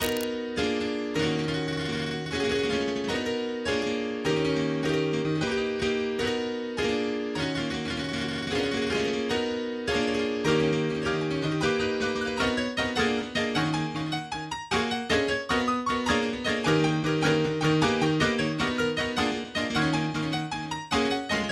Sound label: 2 MIX Kit DMin, MIDI